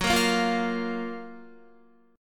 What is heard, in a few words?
Gb Major 7th Gb Guitar; GbM7 strummed; JGuitar